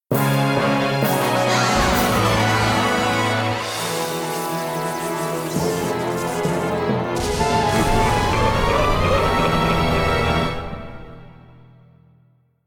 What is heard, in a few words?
bgm intro